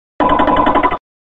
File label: cry; medicham